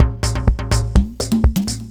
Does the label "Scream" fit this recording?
No